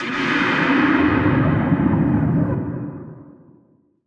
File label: NOISE, Production Effects